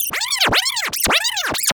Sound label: Chipmunk Scratch Panic